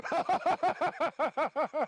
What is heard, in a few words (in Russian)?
veselyj molochnik smeh